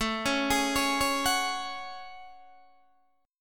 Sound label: A Major 13th, Guitar, Open D; chord